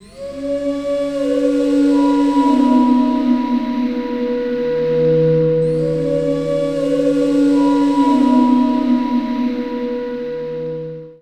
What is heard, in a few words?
Drum & Bass